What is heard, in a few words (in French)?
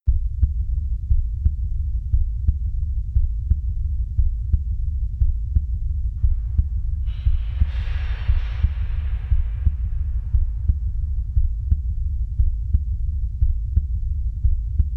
ambiance heartbeat